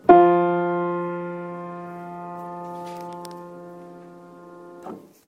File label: Unknown Spinet F3 F4 median just octave